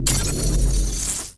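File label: transition; Quantum Redshift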